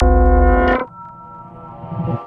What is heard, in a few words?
sliders